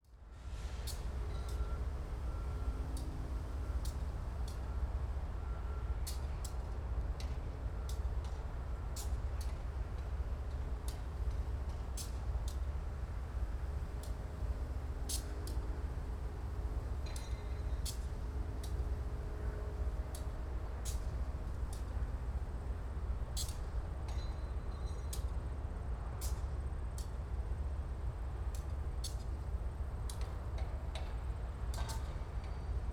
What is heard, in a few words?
Ambience Train Click Near Road; AdobeCC